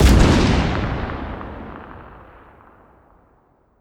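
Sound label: weapon sniper2 fire player atmo ext; gmod; viper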